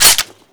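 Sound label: weapon foley beretta 9mm reload chamber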